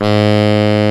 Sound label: G#1, TENOR SAX; AKAI S-Series CD-ROM Sound Library VOL-7